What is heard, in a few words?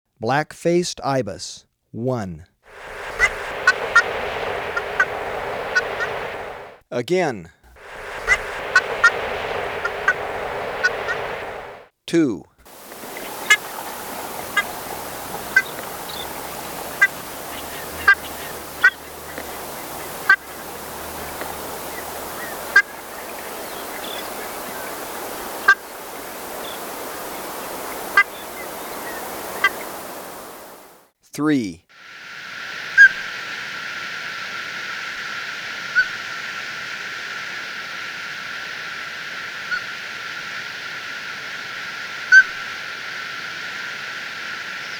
Black-Faced Ibis; S. America